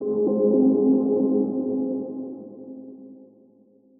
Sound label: Ui notify